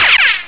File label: peeeow, zaps and beeps